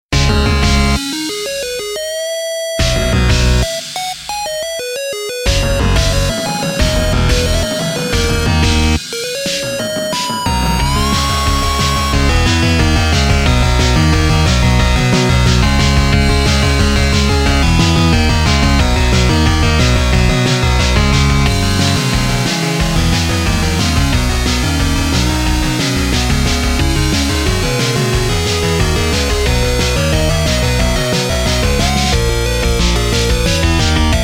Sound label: mountain trail 8bit